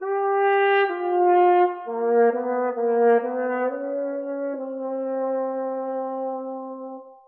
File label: horns sad; Loops